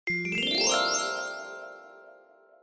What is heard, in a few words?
magic spell trick